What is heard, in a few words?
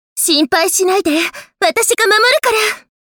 bat; combats